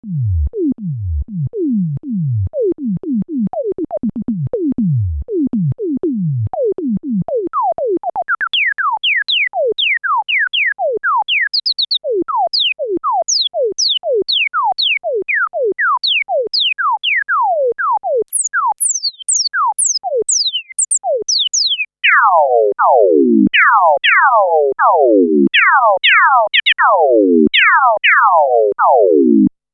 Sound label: clavia nord modular kb filter lpf24 lpf24 q100